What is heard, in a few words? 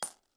coin; swear-jar